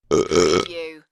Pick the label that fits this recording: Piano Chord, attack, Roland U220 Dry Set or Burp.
Burp